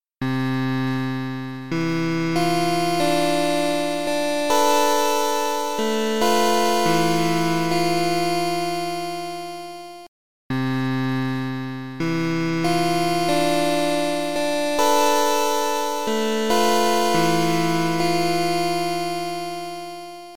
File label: Mobile Ringtone